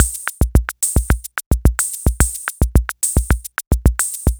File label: Bossa Nova